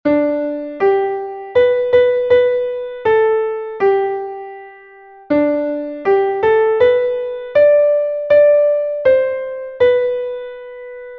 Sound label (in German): klavier; MelodieMeister